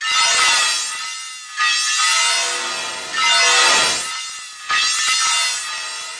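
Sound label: spooky piano phasing, 155 bpm; SAMPLED MUSIC LOOPS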